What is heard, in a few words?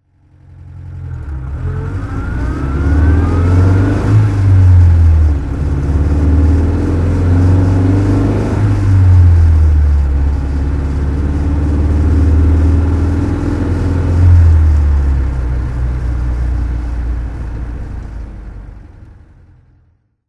austin healey 100 M drive